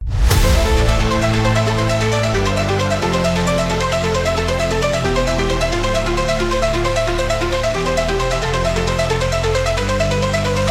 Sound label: 103bpm, Epic Electro